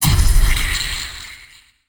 ominous stab harsh; DELTARUNE

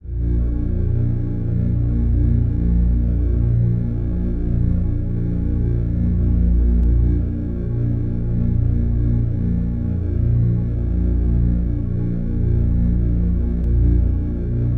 chord basic; statue; DELTARUNE

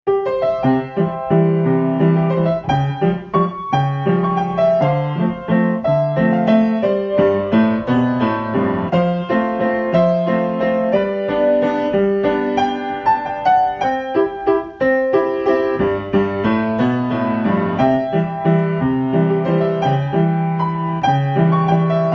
piano waltz; lancer; DELTARUNE

